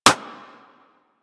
Bullet Impact Generic; Surface

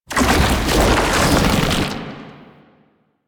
Tank Infested Swipe Charge; Enemies; Abilities; Warframe; Lasrian

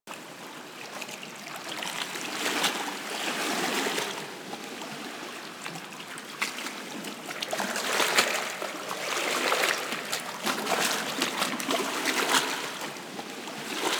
water ocean close loop; Ambience; Props; Loops; Eidolon; Warframe; Eidolon Plains

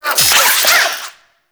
Necramech Repair Drone Claw Spin Alt; Enemies; Warframe

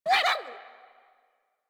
Puppy Idle Speak Excited; Warframe; Kubrow

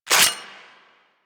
Heavy Shotgun Cock Stop; Weapons; Warframe; Tenno

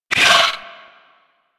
Amalgam Moa Satyr Vocal Pain; Warframe

